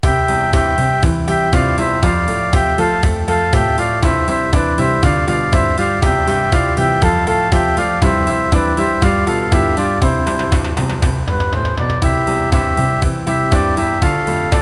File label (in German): kinderlieder playback